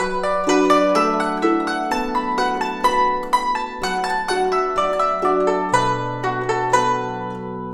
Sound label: Guzheng 124 bpm